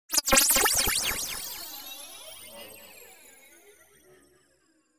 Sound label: sound design effect strange ring; sound effects one